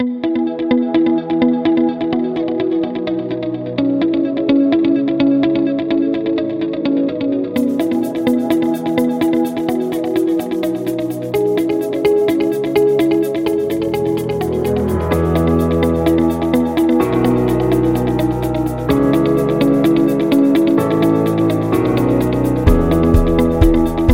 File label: Motivate Corporate; corporate music vol 10